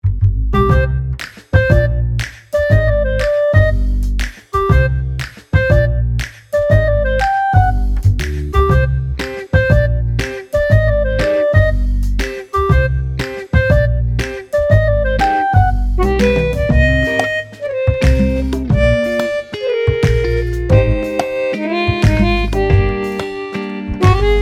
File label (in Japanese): 探偵秘密の会合; ひっそりこっそりしている雰囲気のコミカル感もあるBGM; アコースティック, ジャズ